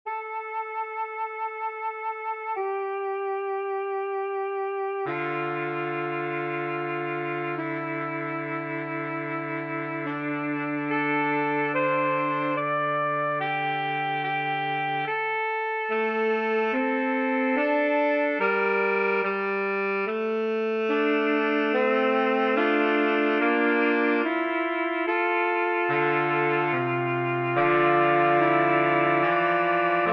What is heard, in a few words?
Cantabili MIDI